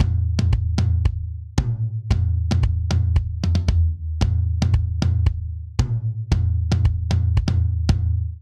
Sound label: Polyrhythmic Dance Verse 114 bpm; MIDI Clips; Ableton; Factory Packs; Drum Booth